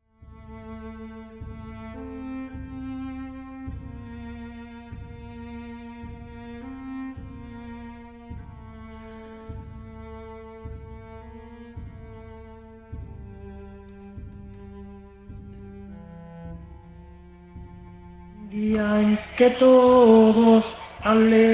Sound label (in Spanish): chantent